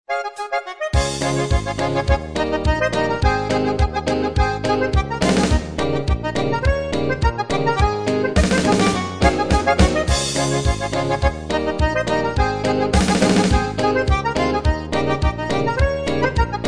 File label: midi